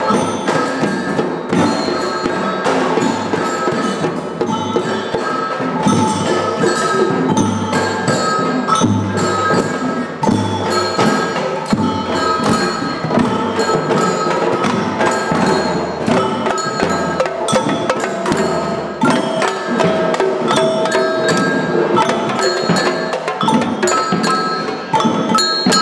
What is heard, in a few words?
Samba